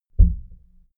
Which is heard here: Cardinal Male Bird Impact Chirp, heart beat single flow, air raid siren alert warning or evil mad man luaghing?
heart beat single flow